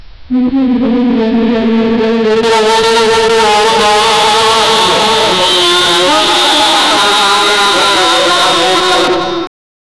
super scary and very loud, gibberish

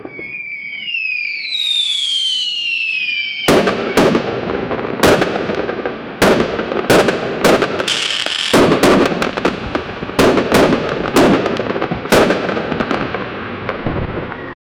firework with christmas

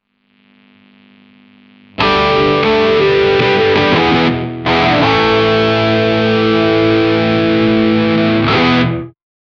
electric guitar breaking, instruments

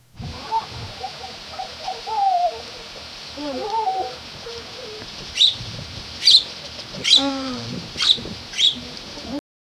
loud sobbing baby raccoon; market chatter

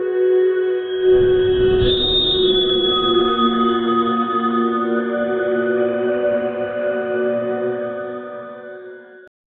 surreal cinematic soundtr

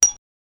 copper button press

